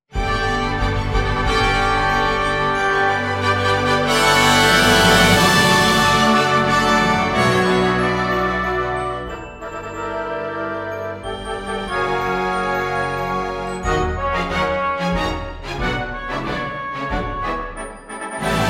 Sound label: Fanfare